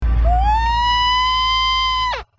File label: screech